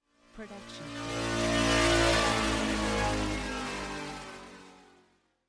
slow fly by

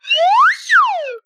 slide whistle short crescendo

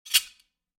sword click; soundscrate

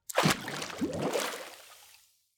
heavy splash; soundscrate

